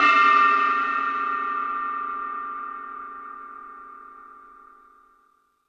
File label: Metal Drums, E-MU Pro-Cussion; drum machine